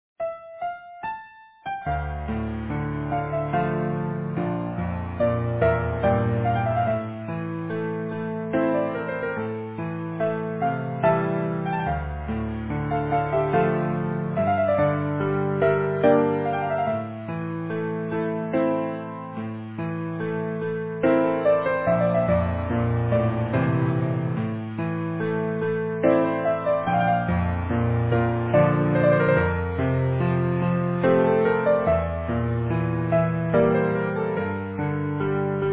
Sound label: Easy Piano